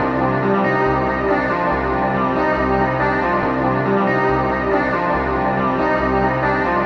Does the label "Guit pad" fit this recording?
Yes